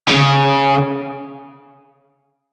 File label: Shawzin Oct Two C Sharp; Music; Warframe; Narmer Shawzin